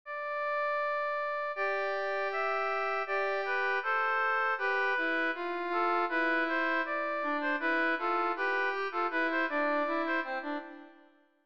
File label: rythm